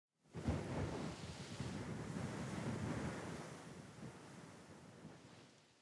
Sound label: wind gust; factorio